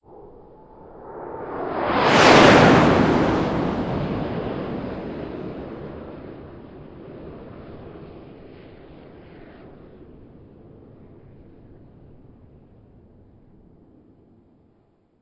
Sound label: jets flyover